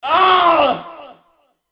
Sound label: scream